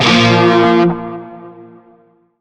Shawzin Chromatic Chord; Warframe; Narmer Shawzin

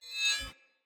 Spear Whoosh Accent; Weapons; Player

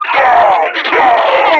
Enemy Death; Warframe; Lasrian; Battle Barks

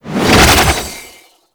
Archwing Prime Sword Whoosh; Warframe

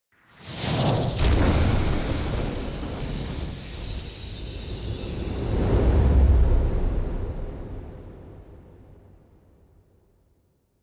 Grabs Acorn Fast Travel SFX; Envoy; Bromius; Wazard

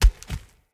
Tree Dead Small Destroy Chunk

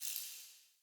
Healing Totem Small Flower; Fey; Ward

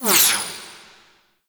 Laser Fire Whiz By; Enemies; Weapons; Warframe; Tau; Sentient Dactolyst

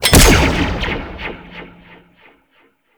Bolt Zipline; Warframe; Ranger

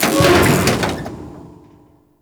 Ice Elevator Stutter; Warframe; Corpus

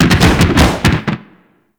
Slide Boost Backfire, Motorcycle; Warframe; Vehicles; Motorcycle Ninety Nine

